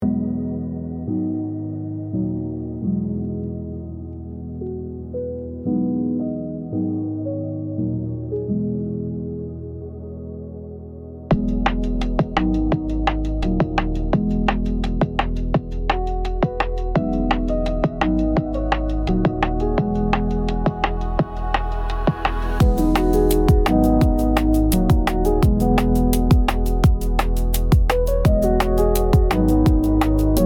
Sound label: Soft pop beat